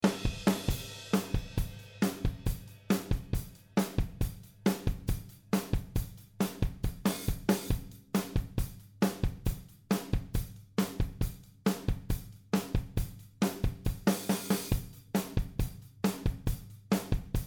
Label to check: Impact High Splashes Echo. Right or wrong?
Wrong